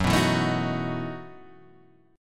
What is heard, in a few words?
E Major 7th Suspended 2nd Suspended 4th Gb